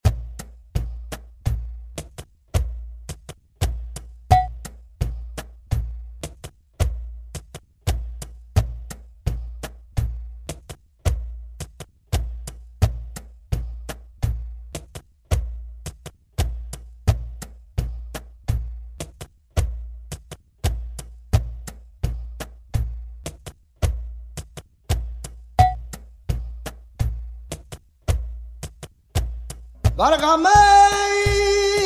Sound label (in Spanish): baile siguiriya, flamenco; seminario